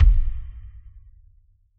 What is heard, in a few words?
acid jazz kit kick